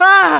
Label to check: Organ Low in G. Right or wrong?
Wrong